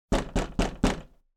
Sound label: door knock; Film Noir; SoundPad